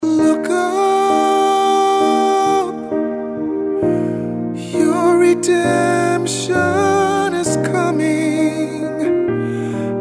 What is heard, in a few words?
ring tone